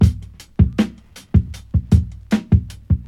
78 bpm drum beat e key, Free drum loop - kick tuned to the E note. Loudest frequency: 242Hz